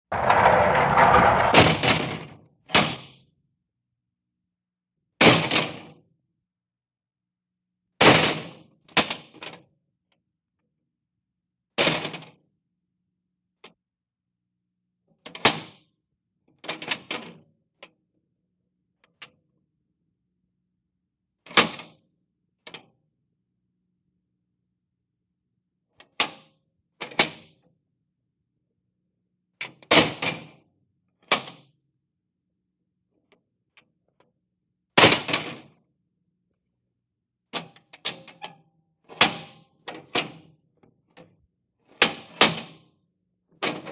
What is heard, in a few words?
Prison Cell Door Slam